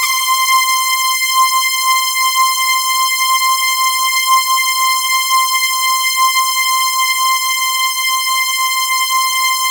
C5, Synth Line; 90 s Sample CDs; NiceBeats; SynthLine VOL-26

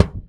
Tok, Kicks; Airwindows

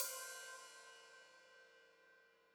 Hat Open, Hi-Hat; Deeply Sampled Drums

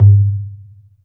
BIGBOOM; Best Service Advanced Media Trax Modern Composer

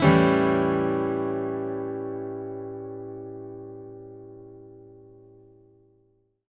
ACCGTR 7TH; Country

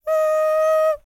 HUMAN TRPT, Jazz FX; Spectrasonics; Vocal Planet

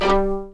G3 PIZ MF, string; East West; The Ultimate String Collection

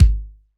Std. Kick; Roland BK-5

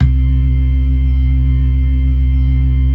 LO MANUAL VIB, Organ

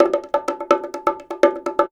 Percussion Loops, Bongo & Conga; Houseworx

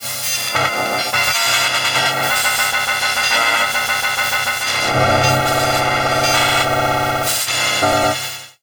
Granulations, Weird; Zero-G Ambiosis

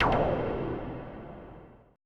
AMBI FX

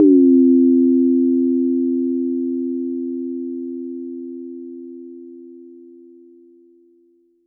Perc

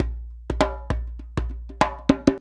100 JMBSOUL; Univers Sons; Basicussions

